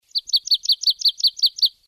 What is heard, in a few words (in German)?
sumpfmeise; nistkasten